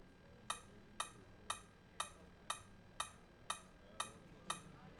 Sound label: audio beacon